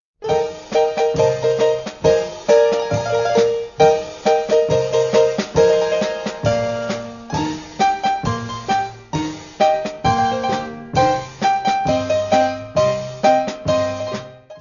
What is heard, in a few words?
orchestrion interlude; filmova hudba; wilder westen heisser orient